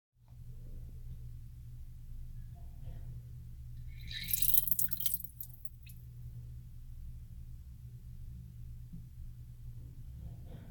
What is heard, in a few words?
taking a pee, pee sounds